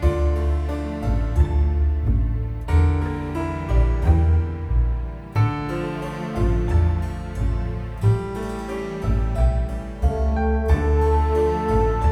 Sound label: Castle Low Intensity